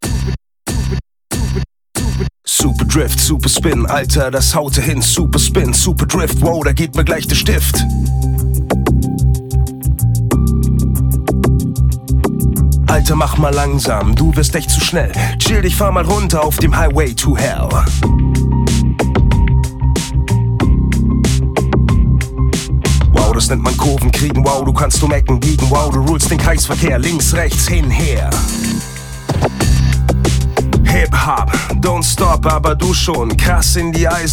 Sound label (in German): Gesang, maenner; Mercedes